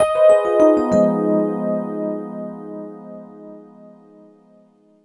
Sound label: EP, Keyboards, Electric Pianos